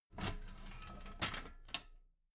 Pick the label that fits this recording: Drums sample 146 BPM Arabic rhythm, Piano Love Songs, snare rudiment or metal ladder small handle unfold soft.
metal ladder small handle unfold soft